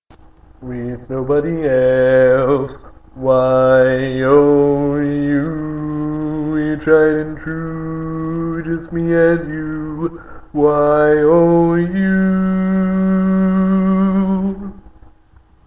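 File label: Baritone, barbershop tags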